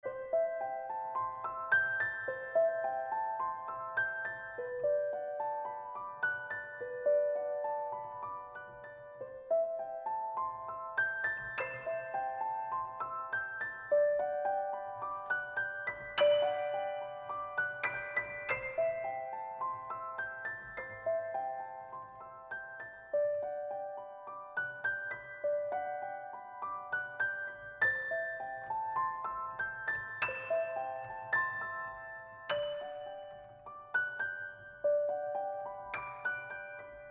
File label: Piano Solo; Caramel